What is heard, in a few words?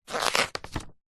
household pamphlet glossy thick page turn; zapsplat